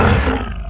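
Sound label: hardrock mammoth move; hardrock mommoth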